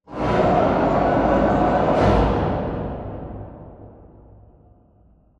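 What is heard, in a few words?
Assembly Furniture Rail Distant; Boomtown; Titanfall 2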